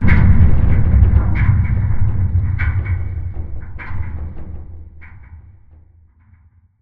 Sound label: dish crane move stop metal stress; Titanfall 2